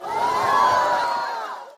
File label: cheer